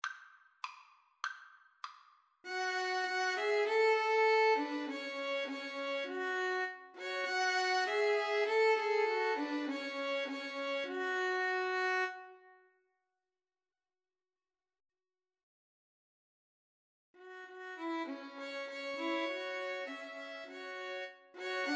Violin Trio

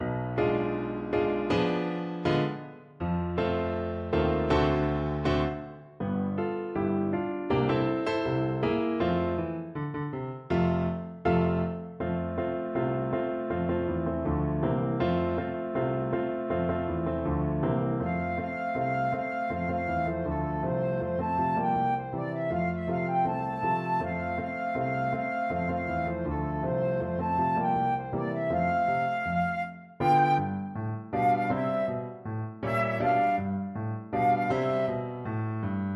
flute

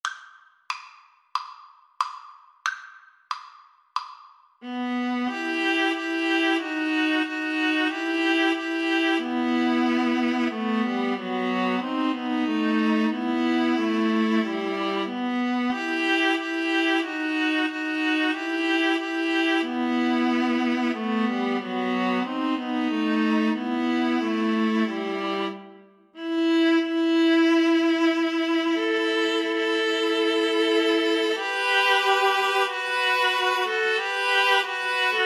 viola trio

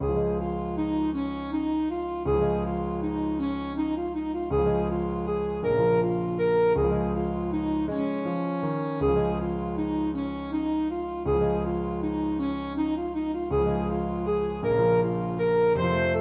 saxophone; grieg; morning

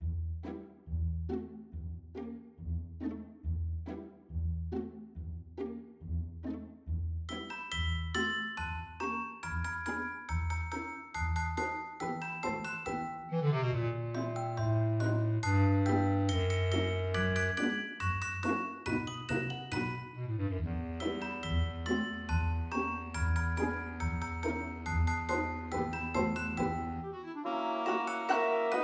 orchestra

